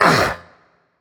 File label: grunt attack; smite; ahpuch